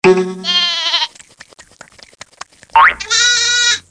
sheep

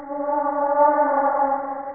ghostly wail; bump n burn

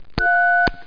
tone; Sound Effects Library 1.5

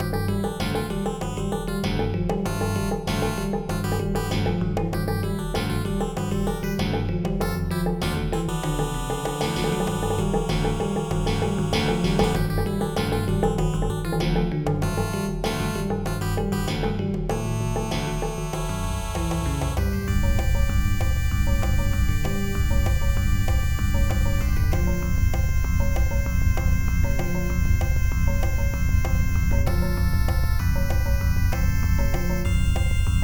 voyage; Flashback Demo

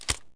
50CAL load; Sierra On-Line; SWAT2DEMO

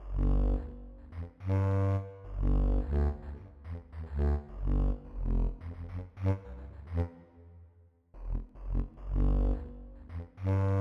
Space Bass

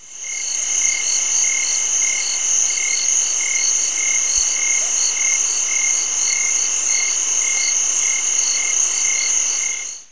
insects; Mr. Wonder's Greatest Toyshop on Earth

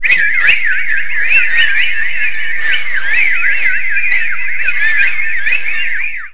whistle

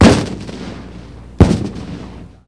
launcher

